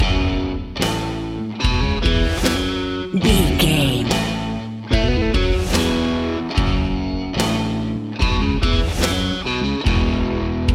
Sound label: big game rock loop